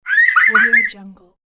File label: little girl squeal laugh; human sounds